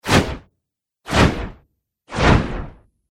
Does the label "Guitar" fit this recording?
No